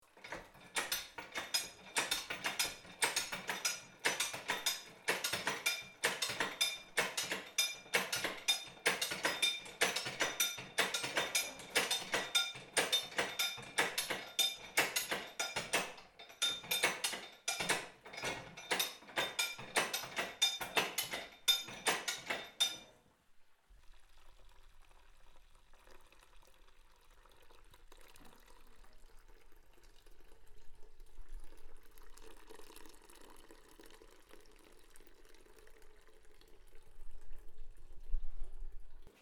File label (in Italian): Torchiatura